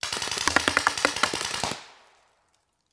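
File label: Paintball Gun Impact; Sound Ideas; Guns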